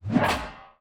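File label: infantry mace, weapon; Battle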